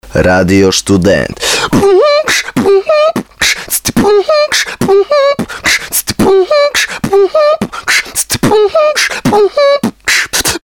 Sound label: BEATBOX CLUBBING